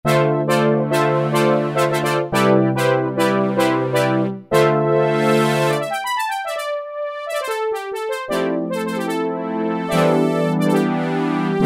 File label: BR Syn, Yamaha TG500